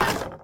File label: wall crate; Original TK Sounds